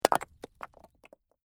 Rock Impact Hit Stones: Rock falls and tumbles onto other rocks; LYN100 package of Stone & Wood sounds; Rock Sound Effects